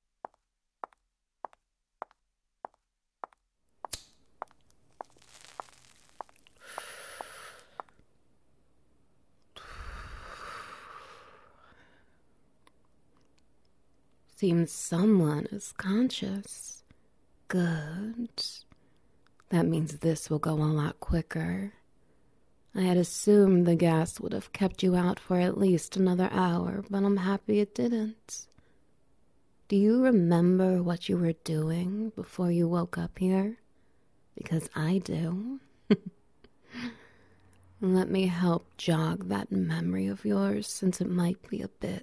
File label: SFX Wet Noises